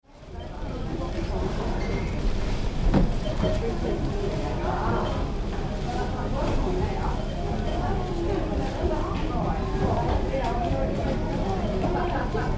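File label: Supermarket Atmosphere - Large supermarket general atmos; chatter - some till noises; Stores And Mall; Tom Hutchings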